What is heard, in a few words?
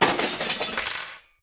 drop